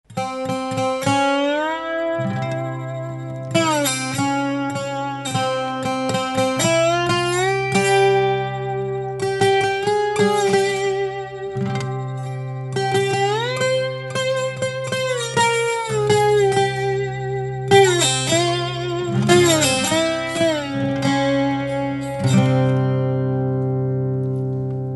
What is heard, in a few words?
Arabic Blues